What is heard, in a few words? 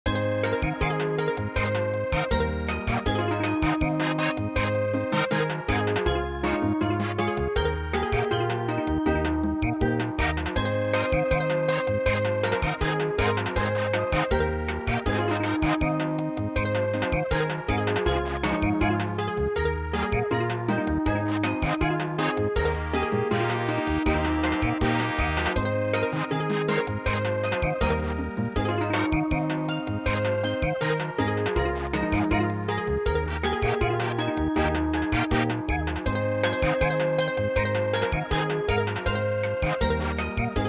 Samba, midi